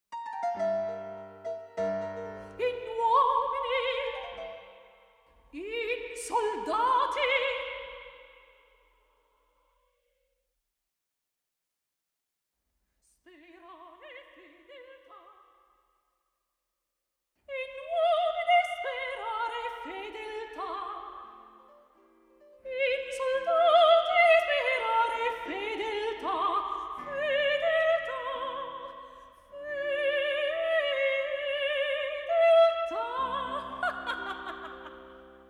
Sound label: Mozart Ambisonics L